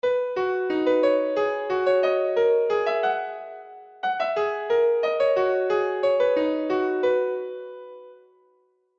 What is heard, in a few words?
Nokia Reversed